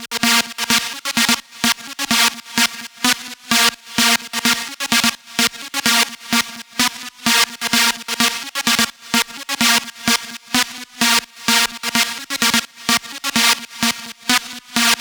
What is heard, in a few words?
128BPM Lead Loops Sidechain - A#; MELBOURNE DROP VOL. 4